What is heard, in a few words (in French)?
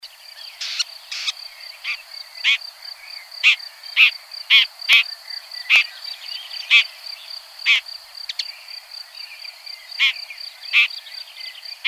Pie grieche ecorcheur